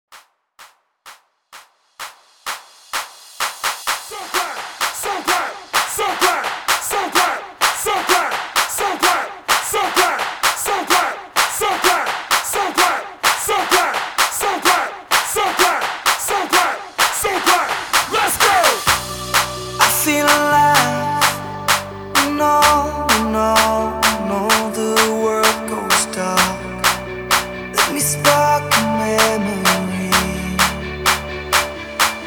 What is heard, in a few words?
PARTY STARTER 128 BPM